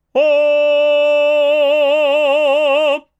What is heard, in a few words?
sing sample, Harmonic Series